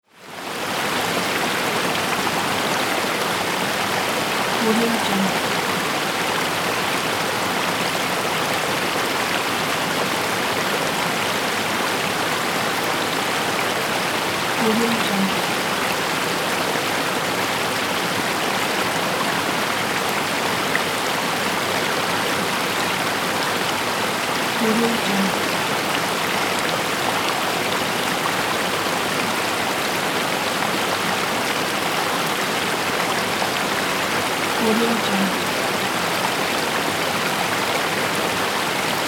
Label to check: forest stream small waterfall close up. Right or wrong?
Right